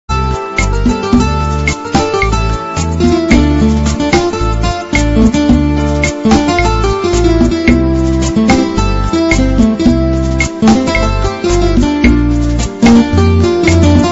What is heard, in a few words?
Spanish Guitar